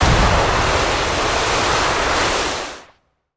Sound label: water magic large; 魔法効果音